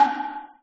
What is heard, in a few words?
finish stamp